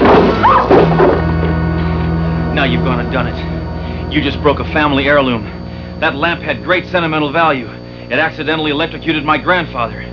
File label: wah